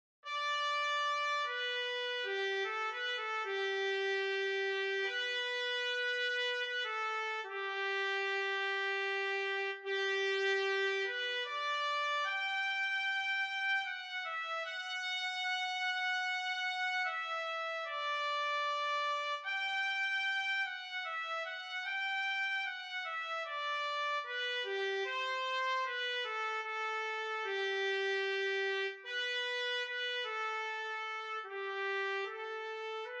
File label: pines of rome trumpet